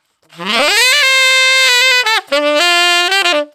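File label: 125 NuJazz Sax D major; Digidesign; Pro Tools; Pro Tools Essential Loops; Brass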